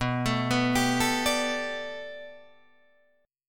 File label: B Minor 7th Sharp 5th B Guitar Drop D, chord